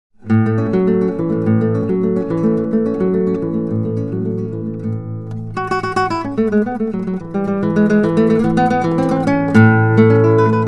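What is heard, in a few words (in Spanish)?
Luciérnaga, milonga; Tango et du Folklor Argentin; guitare SOLO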